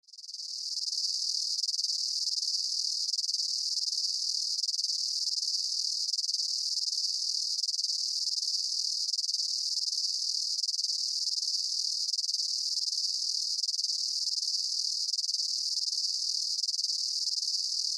Swamp Chirps, Ambience, Swamp, Chirps, Insects, Bugs; 5.1; Surround Sound; BlastWave